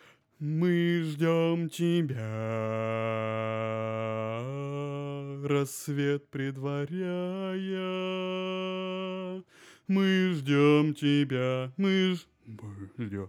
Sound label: bass